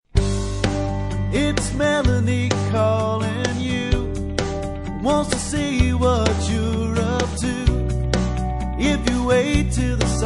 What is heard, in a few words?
Is Calling Pop